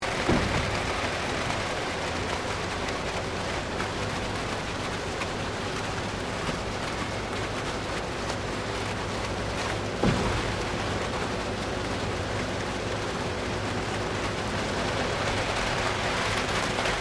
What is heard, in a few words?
Construction Cement Truck Pouring Long